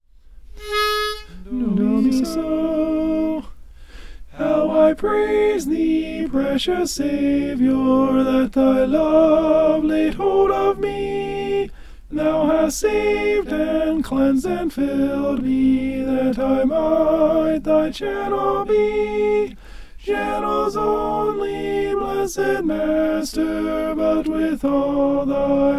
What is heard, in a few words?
alto